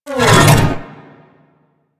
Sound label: Drone Retract; Grineer